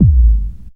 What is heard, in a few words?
bass drum deep, techno trance drums